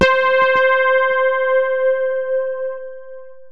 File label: Synths BASS